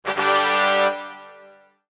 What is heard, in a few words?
tadam na trube